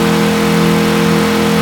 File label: Vw Golf 8 High; car simulator 2